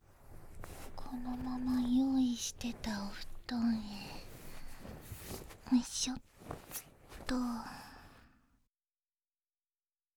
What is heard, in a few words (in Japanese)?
心音+安眠誘導（バイノーラルマイク 効果音あり）, ASMR